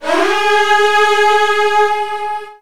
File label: Virtuoso Strings G#2; ILIO; Kirk Hunter Virtuoso Strings VOL-2